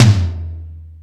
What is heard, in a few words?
Metal Drums, E-MU Pro-Cussion